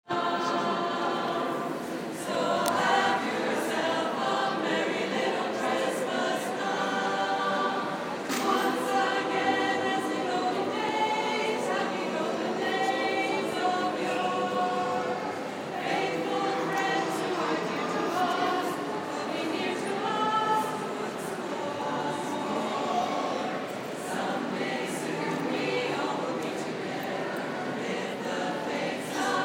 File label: carol singing